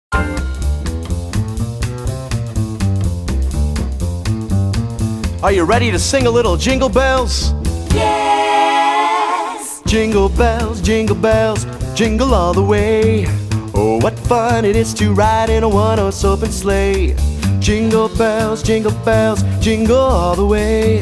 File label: vocals jingle bells